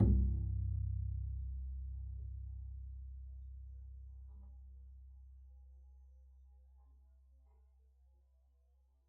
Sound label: pizz c1, double bass